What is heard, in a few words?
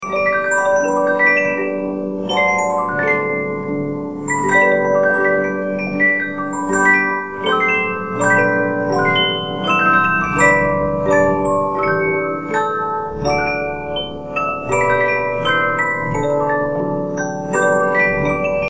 Twelve Inch Miraphone